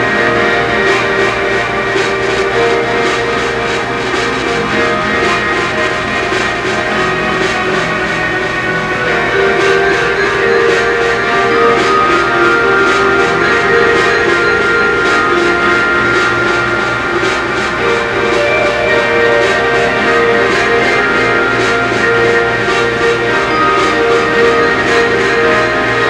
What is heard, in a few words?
Mall Muzak; Postal 2 Paradise Lost